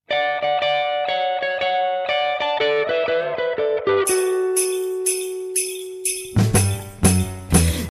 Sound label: Ringtone